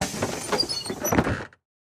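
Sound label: school bus door close; brakes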